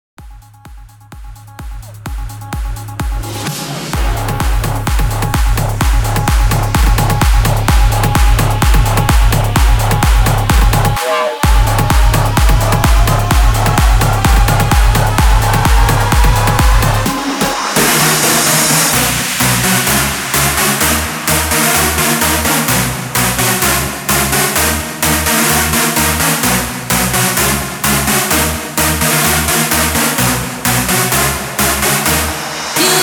BOOTLEG 128 BPM

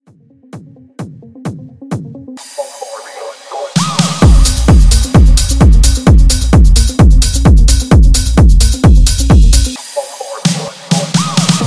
90s Pop Reboot; Remixfreakz

